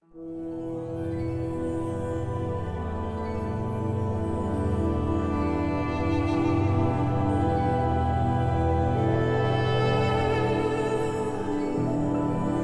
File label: mountains music